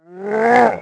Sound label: demon dead